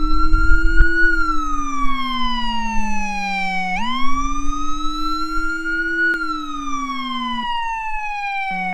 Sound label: WAIL Howler